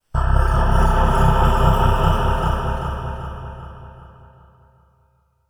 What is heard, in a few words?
Ensoniq SD-1 Echo Pad C2